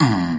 tambores

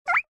cobaya

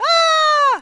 sombrero

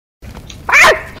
ayy ayy

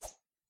vuela

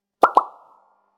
whatsapp burbuja chat

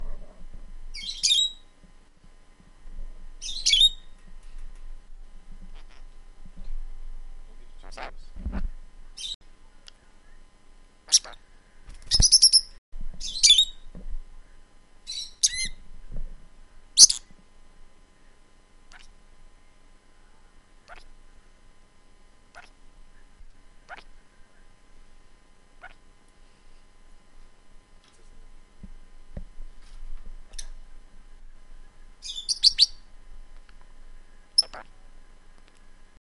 aves

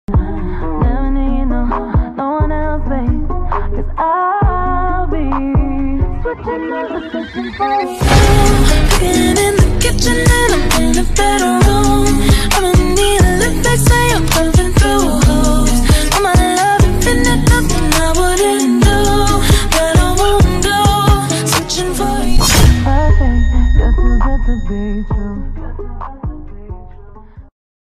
tono suave

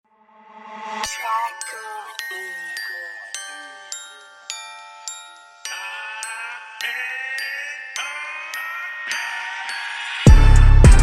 ultra instinto trap

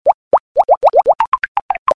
burbujas mix tono

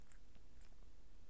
gato

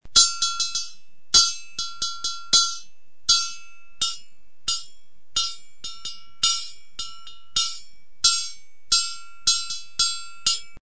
Herrero martillando

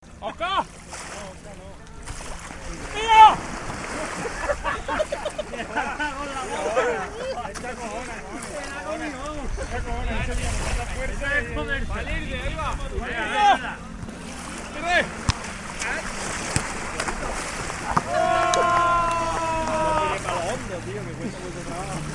el ambiente

